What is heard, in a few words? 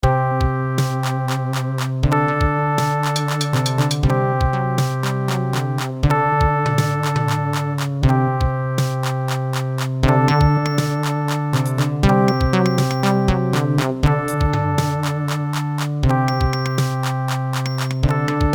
MIDI ditty